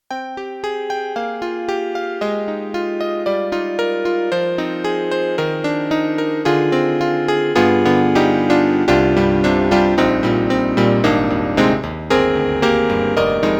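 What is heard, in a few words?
Electric Grand Piano; YAMAHA MDP-5 XG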